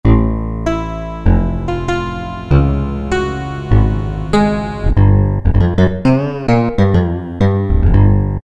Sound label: Picked Bass; Roland PN-D50-02